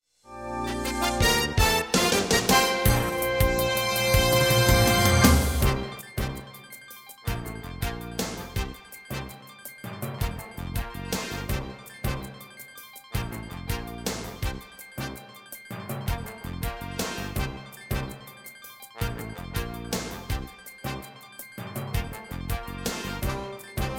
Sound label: NEWS CLOSE STINGER